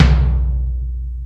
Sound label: large room tom single hit B key